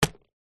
leisure toy plastic large figure walk single footstep wood